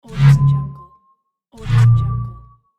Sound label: cloaking device